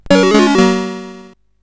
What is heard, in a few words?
sid CSG8580R5; Cavelon II